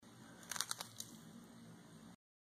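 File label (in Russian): babochka uletela, butterfly flew away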